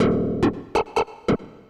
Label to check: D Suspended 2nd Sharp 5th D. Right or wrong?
Wrong